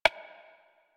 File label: Shawzin Metronome Beat; Warframe